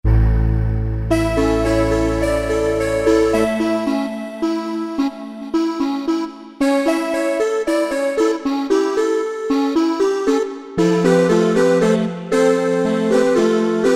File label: Yamaha TG33; Factory Presets; SC-Airy